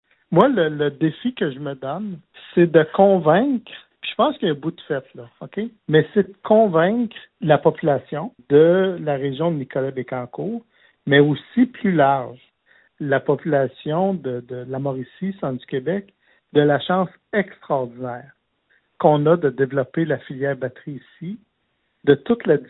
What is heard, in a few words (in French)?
batterie